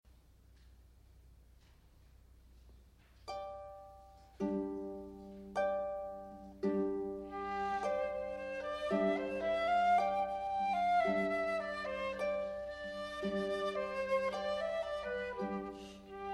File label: Shaker Melody, harp; Simple Gifts